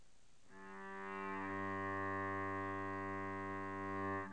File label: cello G